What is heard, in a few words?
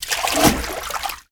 water splash